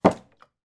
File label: fruit juice carton place down on table with light juice slosh inside